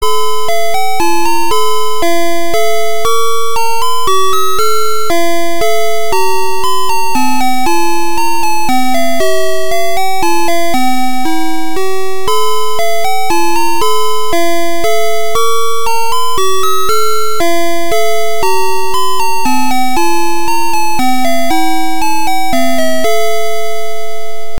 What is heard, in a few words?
menuett